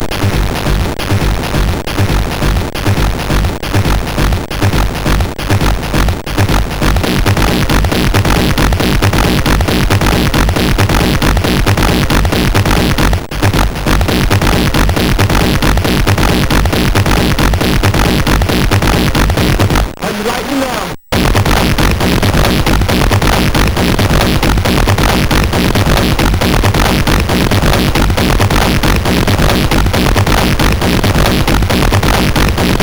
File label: Acid Flashback, MOS6581R2; sid